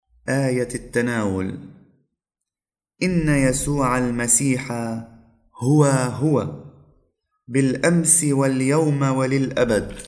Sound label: Communion Antiphon; Solemnity of Mary Mother of God